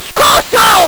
LSDj, Nintendo Gameboy; 300 Drum Machines

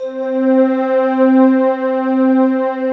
ARCHAICOL C4, Ethereal Atmosphere; USB Soundscan vol.13; AKAI

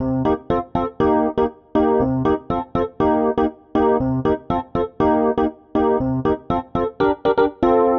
Chord El Piano Cm, 120bpm; Zero-G; Extra Chord Progs

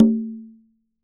CNG G O D, congas tuned; Q Up Arts; Denny Jaeger Private Collection

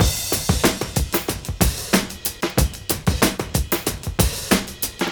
DRM CHOP 93; AMG Gota Yashiki Groove Activator

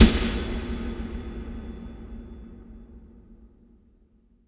IMP DRM & CRSH, Cinematic Impact

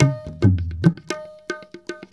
India, Heart of Asia

